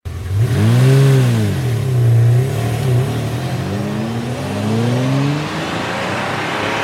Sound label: New Sms; Nfs